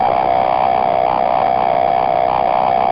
Aircrafts Cockpits AIM9; DCS

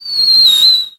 atgm whistle; guns; tanks; War Thunder

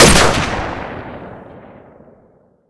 weap wa2000; weapons; ModernWarfare

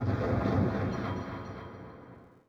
city occasional

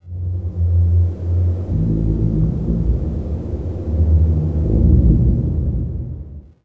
harbor occasional

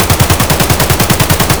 ak74 loop indoor close; Escape from Tarkov

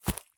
sprint grass low; Escape from Tarkov

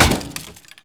metal scrap impact

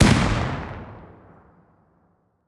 beancan grenade explosion; Rust

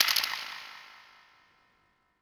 lock radar end

